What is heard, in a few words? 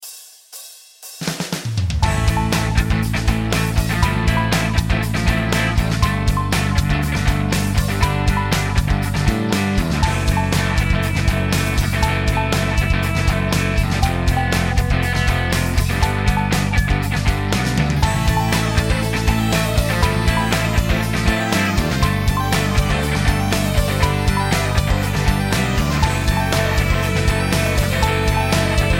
Cascade Kick